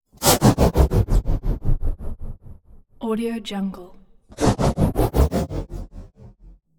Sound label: wooden blades fly tubular whooshing pass bys